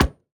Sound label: environment hammer hit